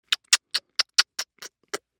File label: KISS array of small affective pecks